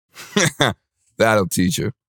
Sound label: laugh; devour 2021 gamerip pc macos